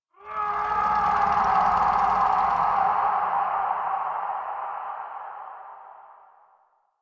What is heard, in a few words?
Distant Medium Howl; Warframe; Sacrifice quest; Umbra